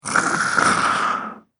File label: Beast Monster; horror; Andrew Fleming